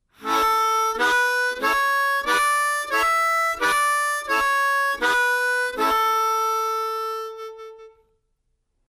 Slap Melody, harmonica

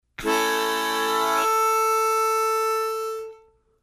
Blow Slap Ta Slow, harmonica

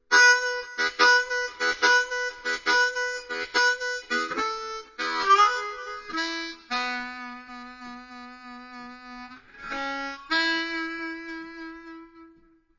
Pull Slow, harmonica

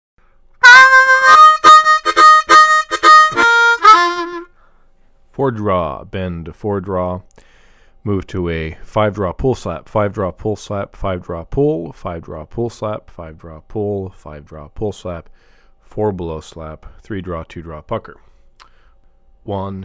Pull Slap Bb, harmonica

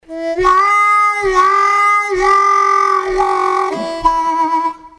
Growl, harmonica

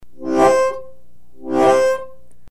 4 Blow Fake Slap, harmonica

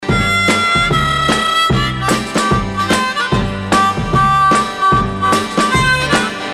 tongue roll, harmonica

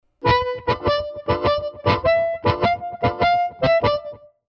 Pull Chrom, harmonica

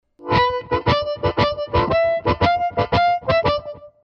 Pull Slap Chrom, harmonica

